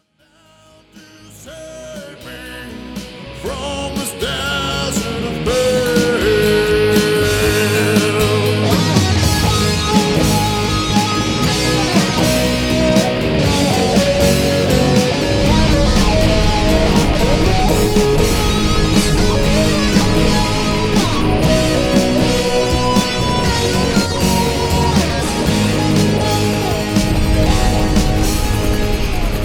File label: GTR SOLO